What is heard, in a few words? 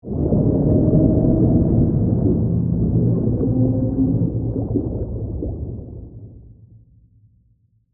Sea Creature Move, Horror, Moan, Whale, Song; Monsters; BlastWave